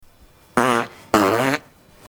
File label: long fart in office